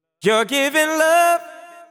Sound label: deep house male, 125, A, vocals